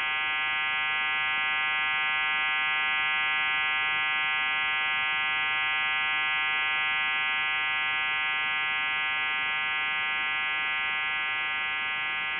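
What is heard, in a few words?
CIS16 standby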